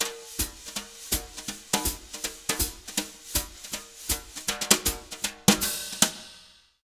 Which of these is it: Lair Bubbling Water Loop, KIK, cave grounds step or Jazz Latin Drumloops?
Jazz Latin Drumloops